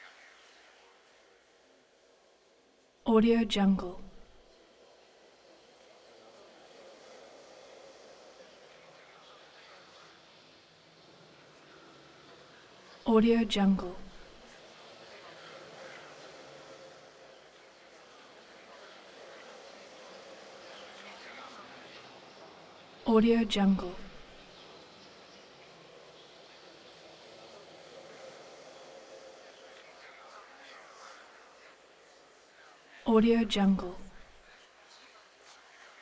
nightmare walla several males

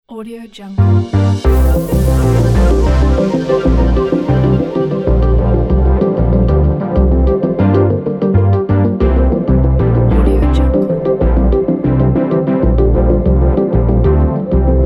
abstract technology groovy background

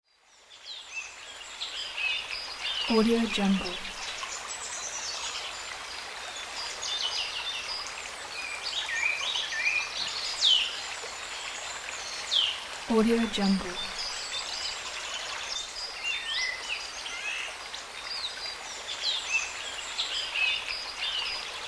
stream and birds

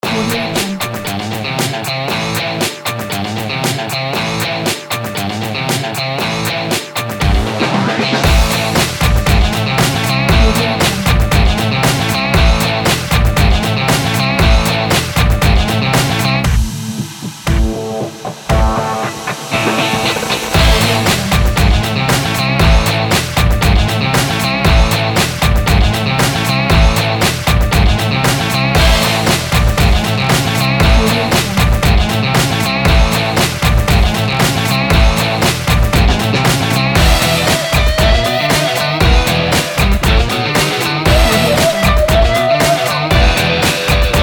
saucy beat jingle

